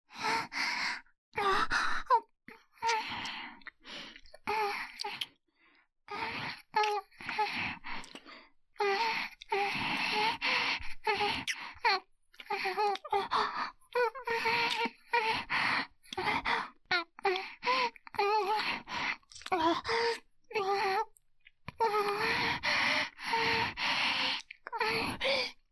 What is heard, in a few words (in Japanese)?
キスしながら愛撫